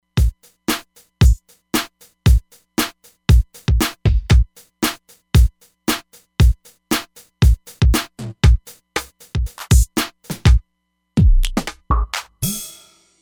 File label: 4 Kit MIX, Roland Fantom XR Drums